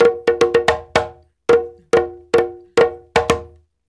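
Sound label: break drum, Reggae; Spectrasonics - Supreme Beats - World Dance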